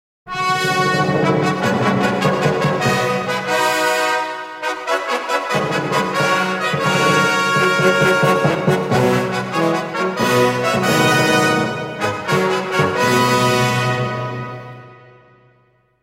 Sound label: fanfare short